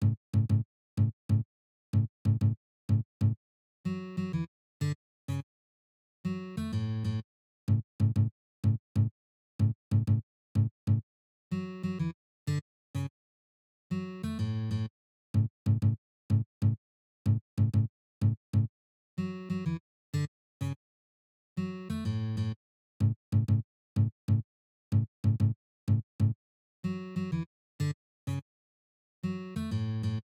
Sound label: Guitar; Level 2